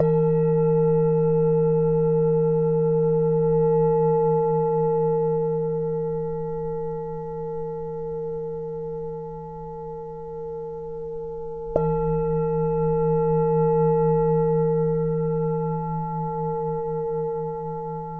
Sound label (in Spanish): Meditacion de 5 minutos para relajarse y desconectar con cuenco tibetano; Fullmoon